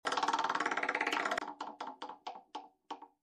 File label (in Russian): baraban